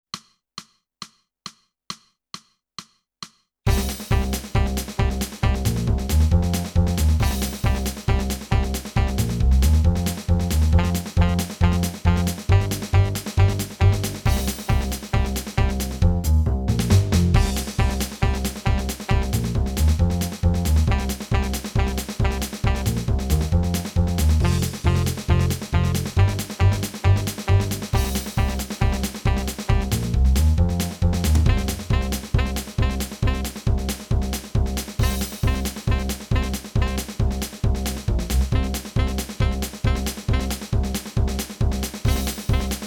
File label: sax